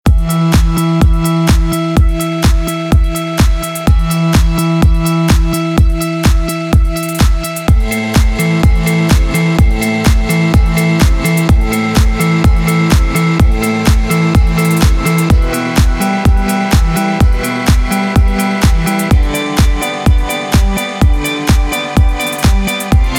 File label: E Ukulele Testfile